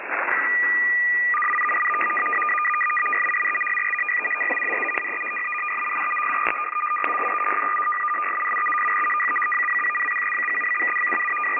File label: fsk100